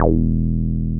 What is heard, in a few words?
303 RES E2M, RES BASS